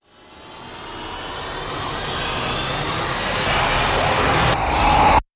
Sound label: Rise Short; Noise Queller